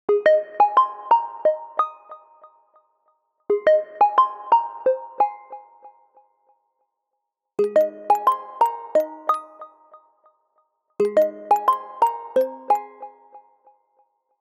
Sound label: tune, ringtones; OnePlus 3T